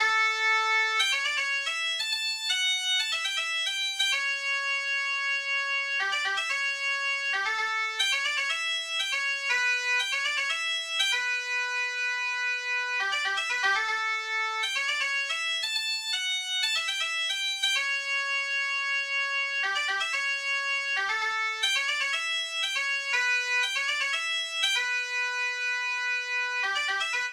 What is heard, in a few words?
Boat Song, Bagpipes; Columbia Boat Song